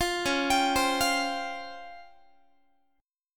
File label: C sharp Major 7th Flat 5th, C sharp, Guitar; arpeggiated; 20,21,22,22,21,x